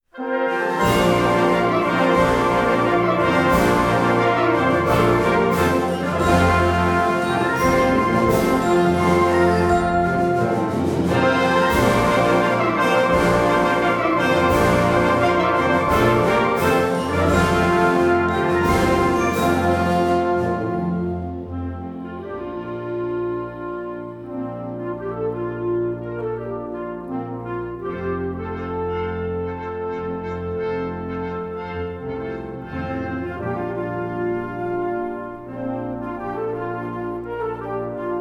seven short fanfares

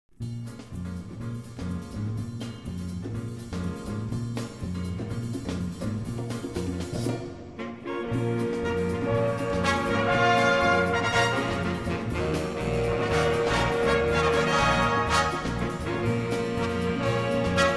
drive

